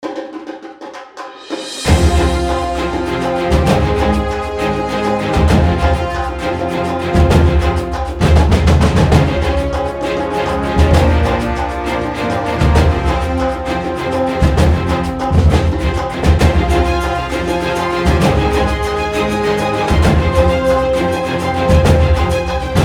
Rio Grooves; cinesamples

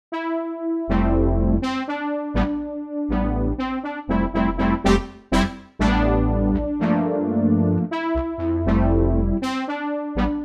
Fat Syn Brass; dexibell

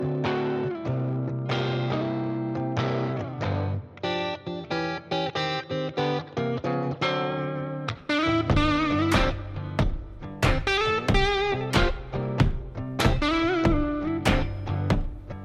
blues, solo acoustic Mississippi Delta blues; Soundstripe

